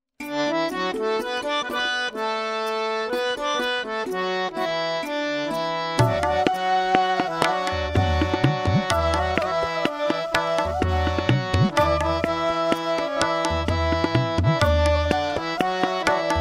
Vibhuti Mantram Karaoke Track

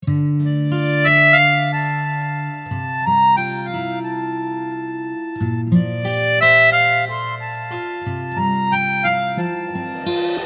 Ringtone

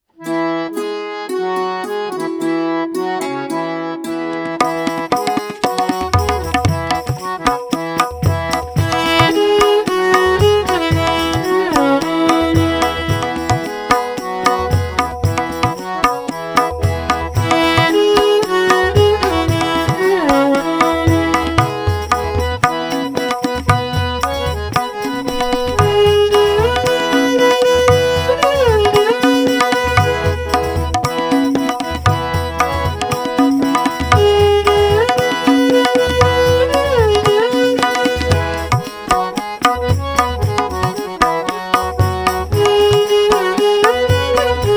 Gents Karaoke E Pancham

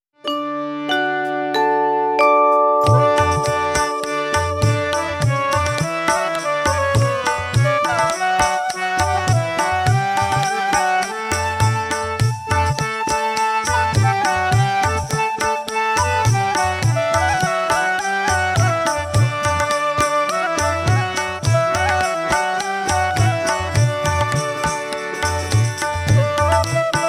Karaoke Track D Pancham; Sarvadharma Prayer

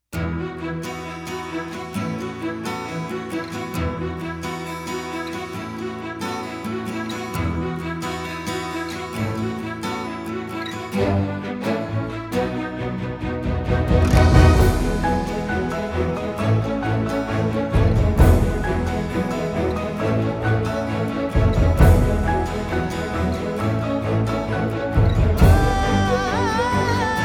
Karaoke; 90th Birthday Theme

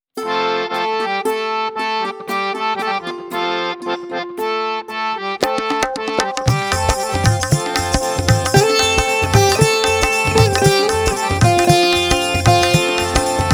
Ladies Karaoke F Pancham

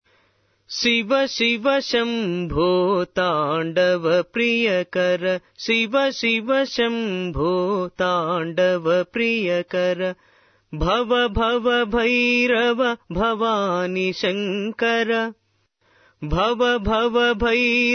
Shiva Shiva Shambo tune

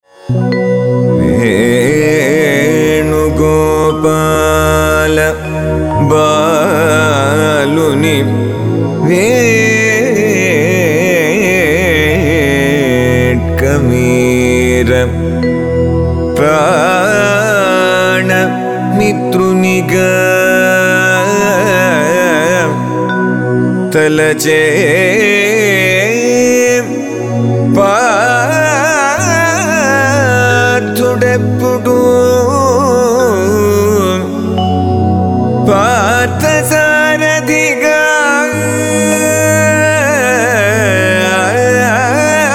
Padyam; Venu Gopala

